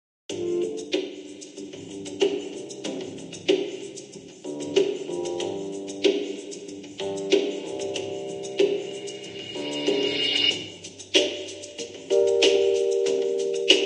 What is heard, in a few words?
Lounge Music; Mass Effect 3; Leviathan; Normandy